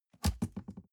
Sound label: cardboard box open unfold bounce; objekte